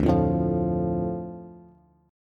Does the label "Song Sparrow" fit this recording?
No